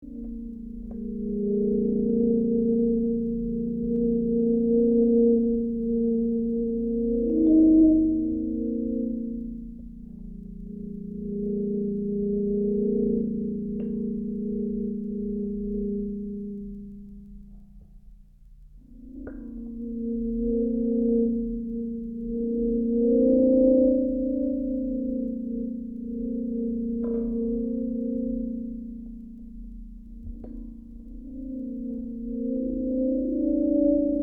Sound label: summer rhein harp